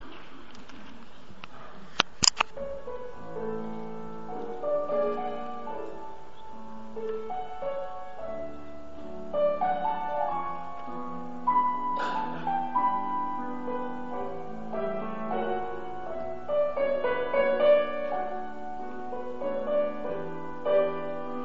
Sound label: battement fondu re battement frappe